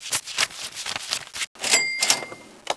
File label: cash register